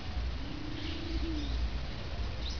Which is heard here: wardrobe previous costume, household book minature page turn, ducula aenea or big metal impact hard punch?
ducula aenea